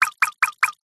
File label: game sound water drip x4; zapsplat